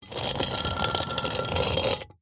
foley stone or flat rock single knife blade scrape scratch hard